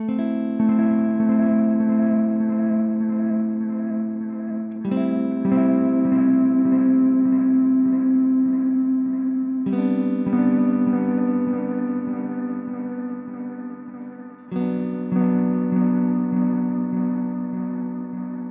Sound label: Ambiant Swell, echoplex delay; echoplex delay settings; Meris LVX